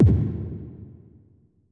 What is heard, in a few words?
boom